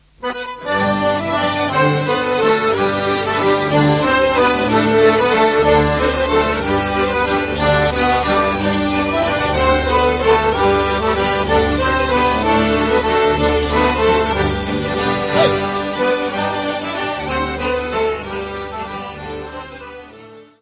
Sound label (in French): Valse, Danemark; SOREN LUND WALZ